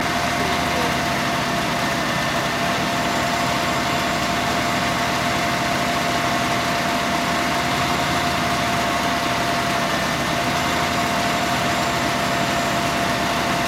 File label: construction vehicle engine loop